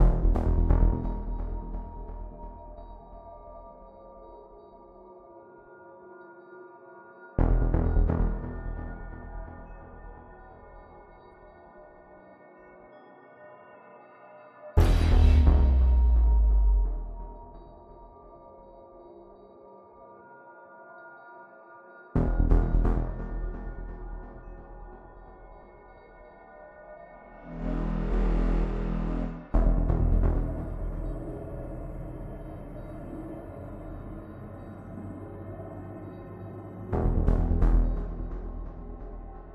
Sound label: Main menu music; Spacebourne